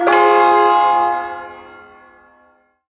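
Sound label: Shawzin Minor Chord; Min Chord G; Warframe; Duviri Ersatz Shawzin; Chords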